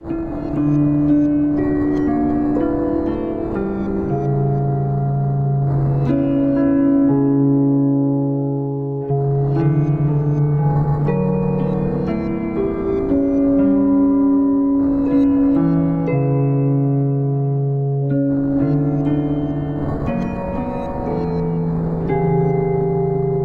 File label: Dark Night City Keys Piano Music Dramatic Cinematic